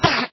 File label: hit, endermen; ayuncraft-1.5